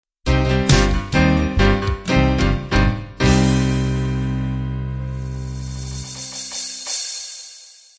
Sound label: piano rock end